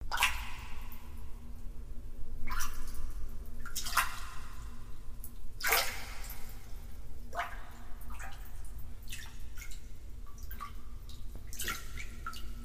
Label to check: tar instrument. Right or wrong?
Wrong